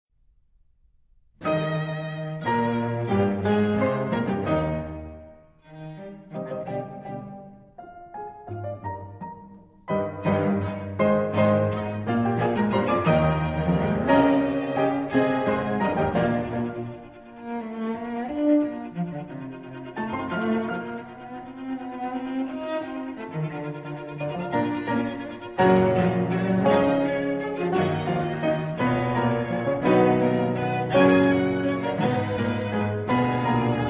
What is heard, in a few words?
Piano Trio E Flat Major Allegro; Schubert; Piano Trio No2; D929